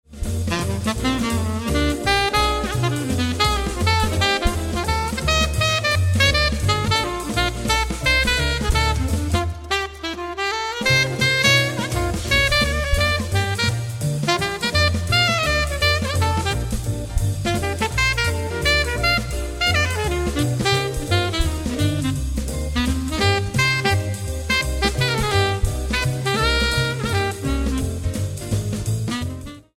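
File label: Alto, sax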